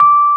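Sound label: EP D6 MP, electric piano; Greytsounds VOL-1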